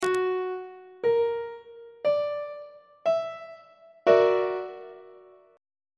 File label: f sharp augmented 7th chord; on treble clef